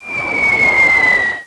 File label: mortar hit; chicken fortress 2